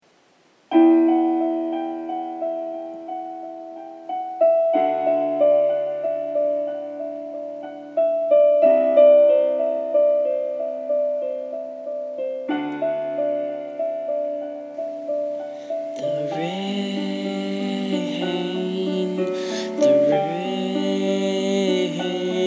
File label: Rain